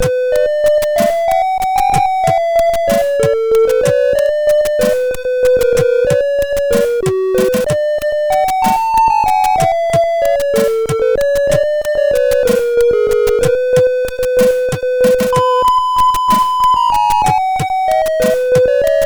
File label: Cover, CSG8580R5; sid; hvsc